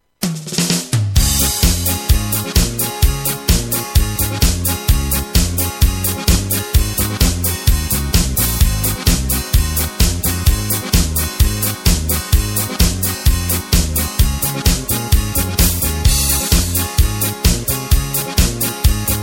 Marsch Playback

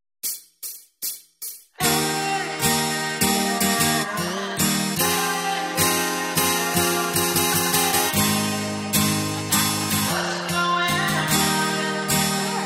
Playback Chor

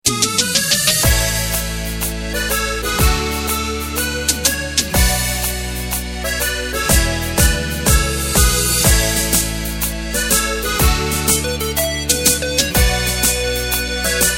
Schlager Medley, Playback

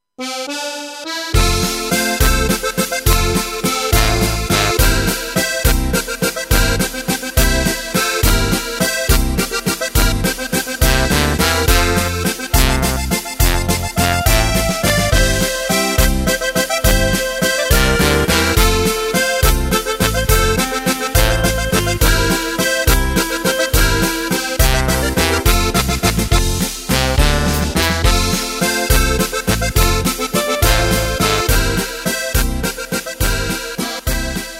Walzer

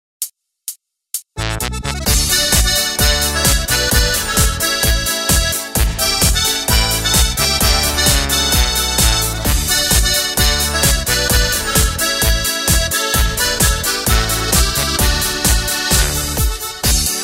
Stimmungsmedley

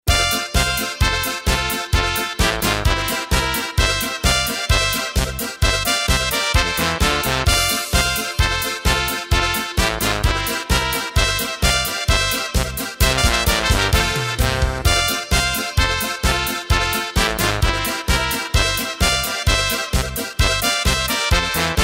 Lustige Trompete

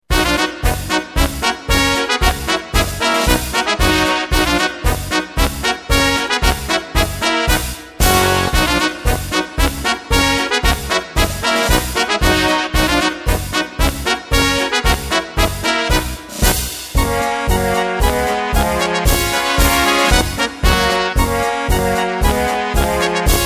Polka

